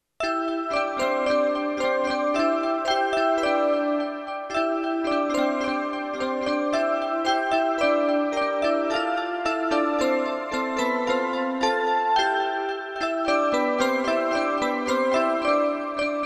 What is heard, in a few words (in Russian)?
Мелодия на часы Славься; 4 ноября День народного единства